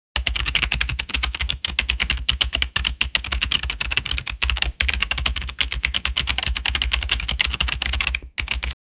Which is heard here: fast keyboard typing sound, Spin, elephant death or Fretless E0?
fast keyboard typing sound